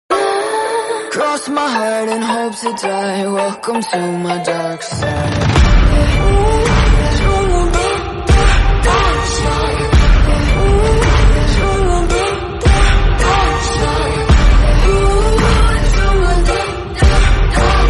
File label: ringtone beat bgm